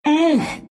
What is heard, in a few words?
grunts, ru